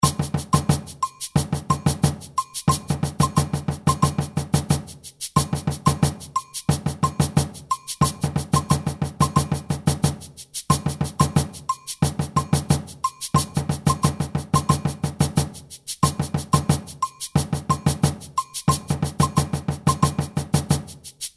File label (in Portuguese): samba reggae agogos sourdo shaker